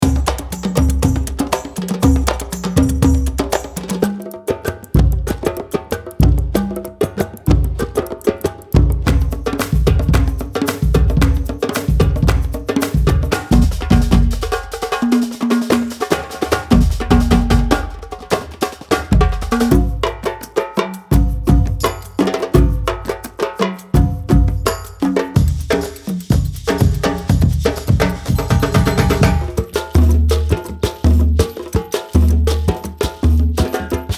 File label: afro-arab beats